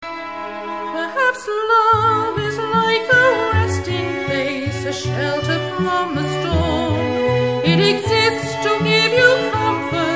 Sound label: Strings